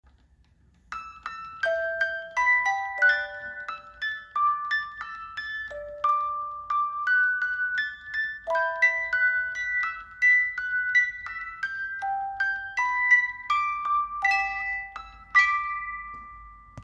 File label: 18 lames, boîte à musique; Boîte à musique en bois personnalisable.